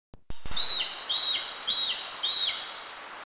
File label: Green Magpie (Long-tailed Broadbill Mimic), Eaglenest, India